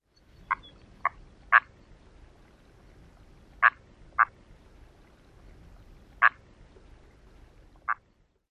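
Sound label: soft croaks, Red-breasted Merganser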